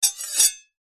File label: knifes medium scrape blades together